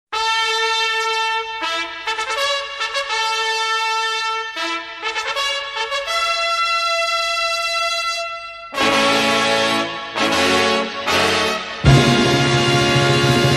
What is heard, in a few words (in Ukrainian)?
fanfari